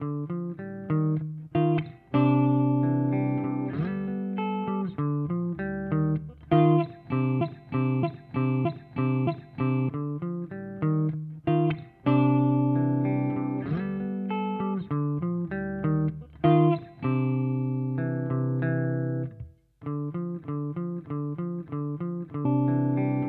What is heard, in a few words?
Loop FX Repeat; Boss RC-500